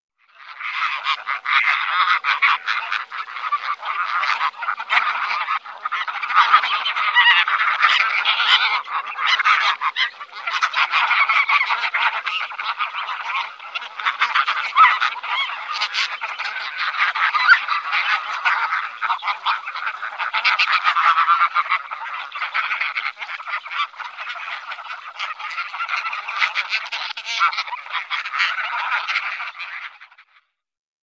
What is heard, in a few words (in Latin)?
Anser anser, nasiptaci